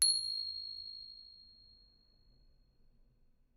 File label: thai ching; Thailand